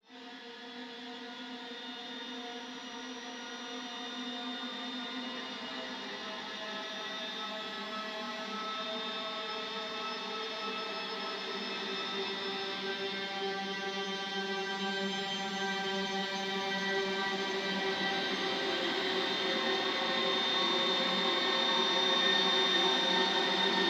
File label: gtr FX